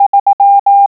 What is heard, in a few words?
THREE, morse